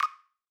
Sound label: Menu Command, sound scheme; Longhorn 7ista Sound Scheme by David Mounder